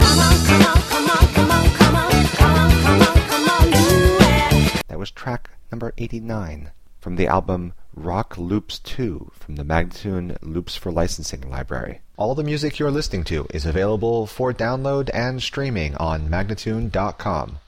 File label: 100 E spoken, Rock Loops; Loops For Licensing; MagnaLoops